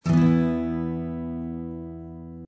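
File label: 1 shot acoustic guitar chord E; Lounge & Reggae; Loopology; Be Bop Baby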